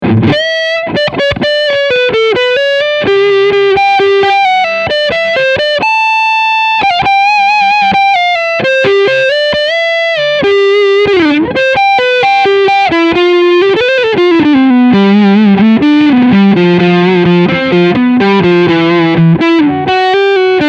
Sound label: Front PU Overdrive